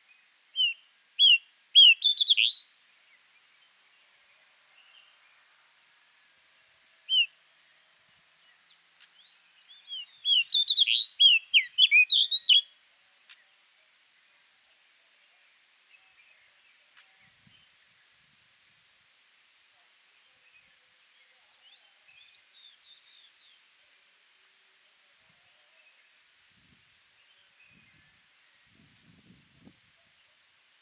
Pied bush chat

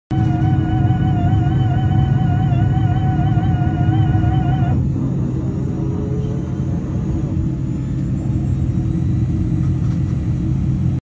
Boeing-787; بوئینگ ۷۸۷

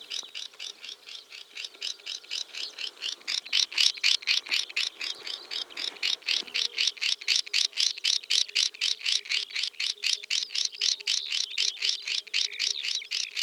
Swinhoe's snipe; صدای پرنده پاشلک چینی

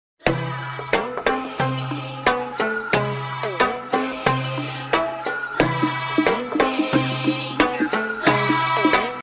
Geomungo, ساز سنتی کره‌ای

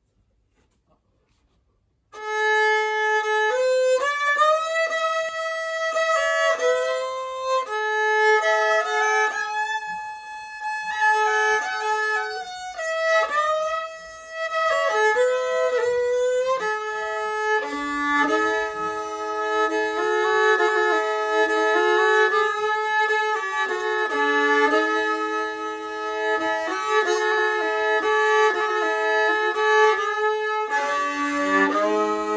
rebec

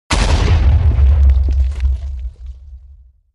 Creeper explosion; میم انفجار کریپر

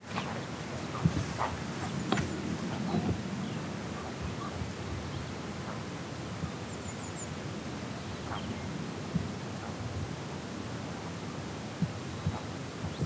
Great bustard

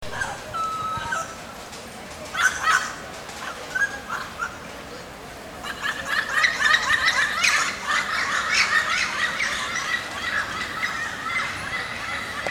yellow crowned amazon; پرنده آمازون تاجزرد

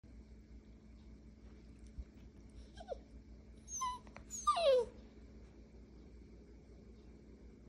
Poodle; سگ پودل

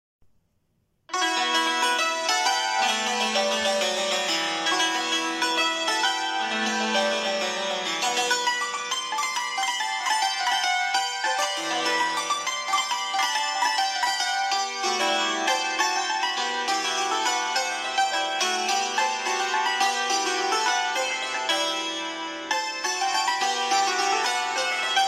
Santour

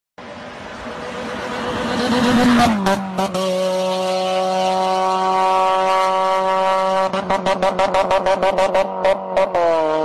xantia

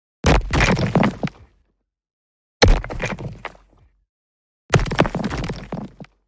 brick

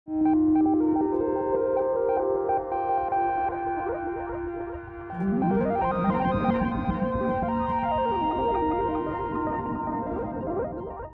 modular

